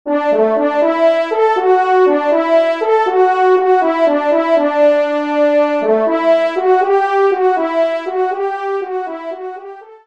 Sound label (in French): Reveil; Auteur : Charles Koechlin; Année de création : 1935; opus 142